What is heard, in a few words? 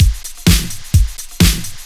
Straight Loop 128 BPM, House